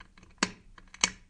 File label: Light Switch Pull Ch; switches